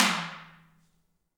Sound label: T DRM, Heart of Africa; Spectrasonics